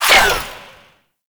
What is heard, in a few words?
Ranged Weapon Whizzby; Warframe; Necramech; Weapons